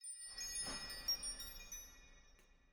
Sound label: Victory Tinkles; Yoda